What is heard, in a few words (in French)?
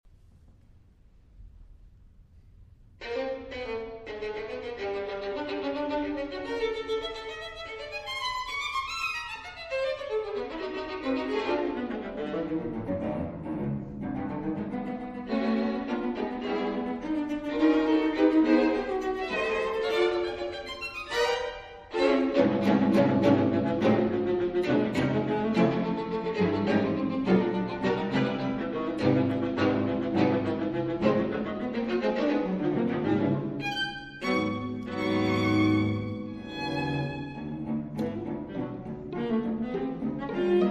Quatuor à cordes; Frank Martin